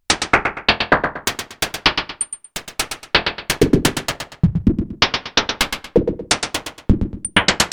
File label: modular rhythm; novation peak test